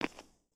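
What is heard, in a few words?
Foot Concrete Sneaker Walk, footsteps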